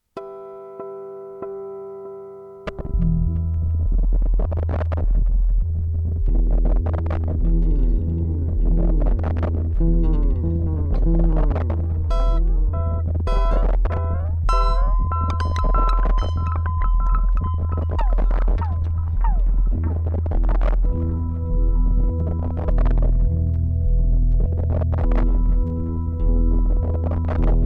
electric lead in

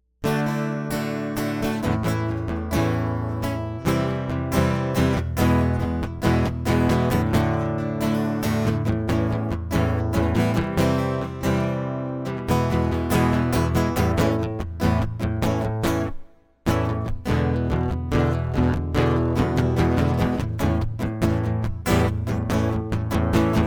acoustic

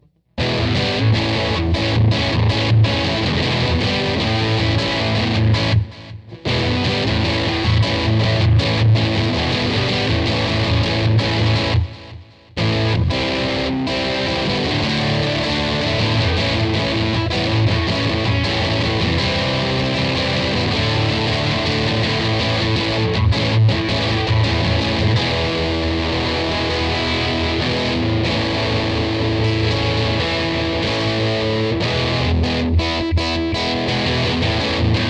electric; Amidst the Coming Undone

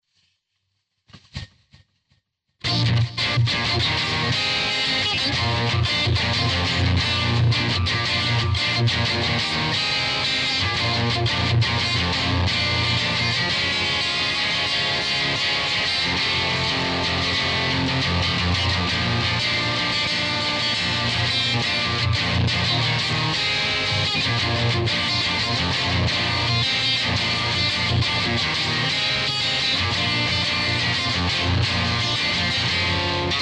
electric

